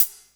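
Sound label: closed hi hat, 150 BPM; Ueberschall; Drum & Bass Resonance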